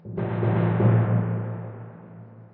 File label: Tymp